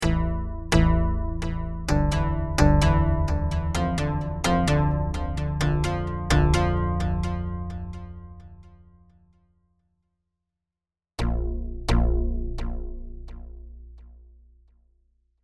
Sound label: Synth Riff With Delay; Dexter